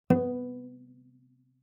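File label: Cello pizz fortissimo sul G B2; MIS Pitches - 2014; Strings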